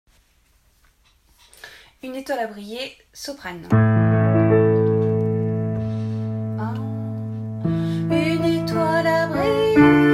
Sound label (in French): soprano; 263- Une Etoile a brillé